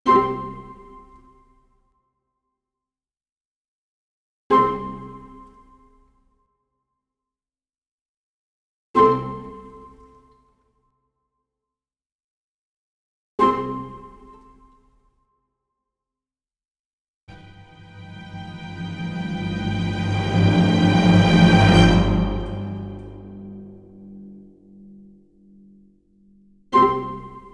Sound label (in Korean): Comic 드라마